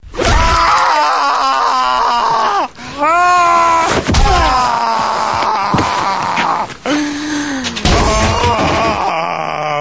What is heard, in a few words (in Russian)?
krik